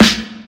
tight low end snare drum sample a key, snare one shot tuned to the A note